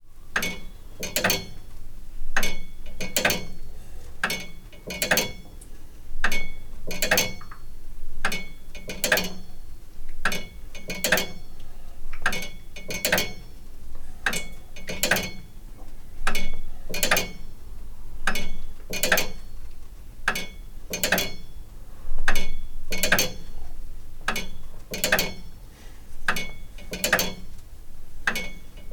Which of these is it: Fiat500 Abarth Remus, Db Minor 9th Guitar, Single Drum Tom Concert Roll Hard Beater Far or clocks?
clocks